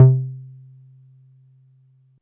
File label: Dance Floor Bass, Multisample Basses; Best Service